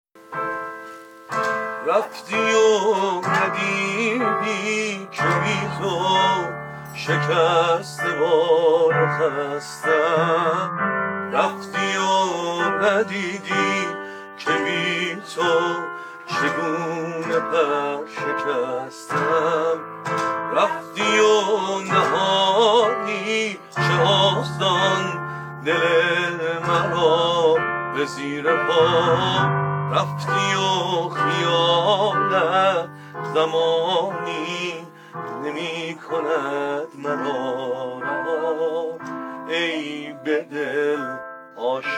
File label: Piano Version